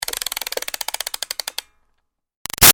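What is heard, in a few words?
Toy Music Box Wind Up Medium; Wind Up Toys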